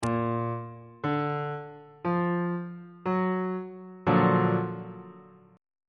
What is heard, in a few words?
f sharp augmented major 7th 1st inversion on bass clef